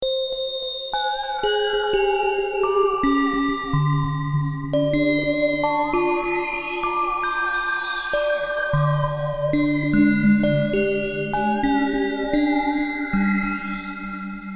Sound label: Bells of Weirdness; Horror/Surreal Music